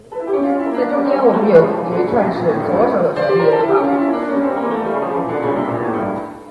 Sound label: chopin; 在中间有一段是左手在歌唱